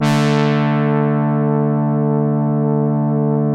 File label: QUINT HORN D3, HARD BRASS; Music Store - Claudius Diemeter Schwerlos